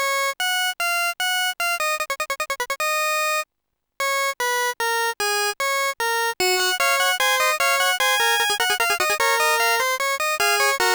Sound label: fugue, ORG; Deluxe Music Construction Set